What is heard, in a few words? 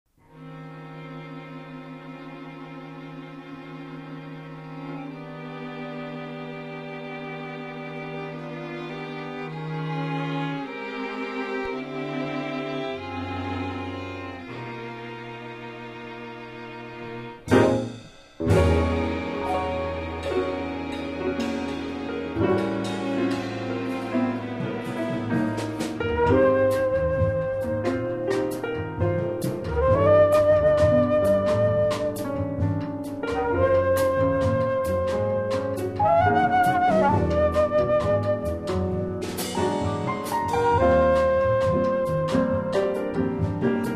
strings